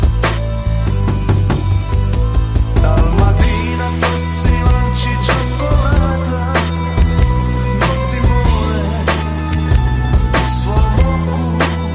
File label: INSTRUMENTAL